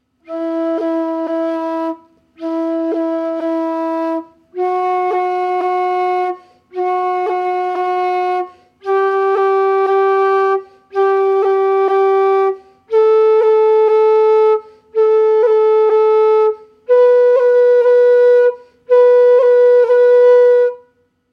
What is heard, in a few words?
roll, irish flute